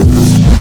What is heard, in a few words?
biomech air blast